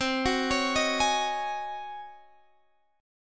Db Major 7th Suspended 2nd Suspended 4th, C, guitar